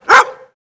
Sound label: Dog Bark CU High Pitch